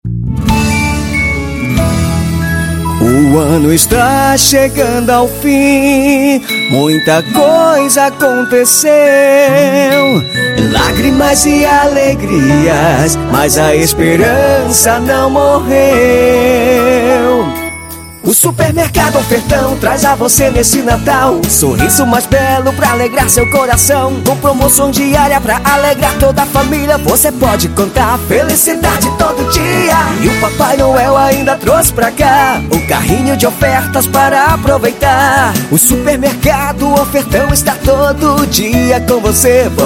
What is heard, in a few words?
Jingle; jingles natalinos